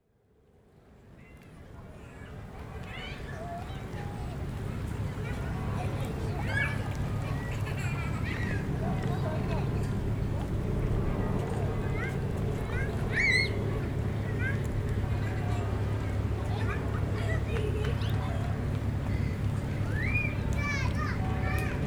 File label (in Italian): Parco Viale Verbano